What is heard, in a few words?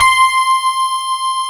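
grand piano; AKAI S-Series CD-ROM Sound Library VOL-7; D.GRAND PF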